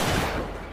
revolver shot

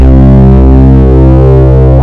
spatial acid bass

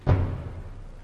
bass drum

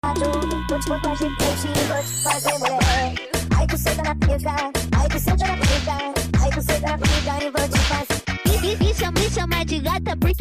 brazilian dance

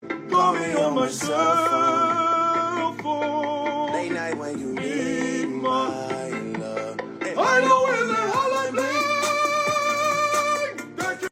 opera

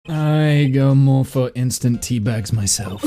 always gagging

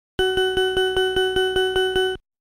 pacman extend

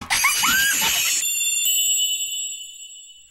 magical elves

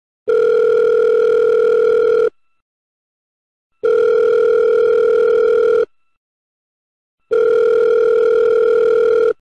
iphone ringetone

